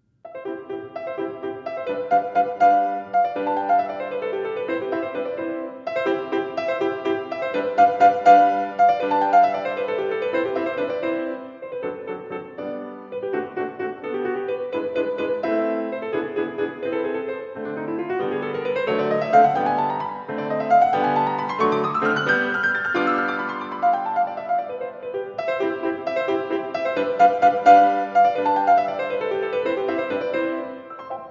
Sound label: Sonatina, Sonatinas For Piano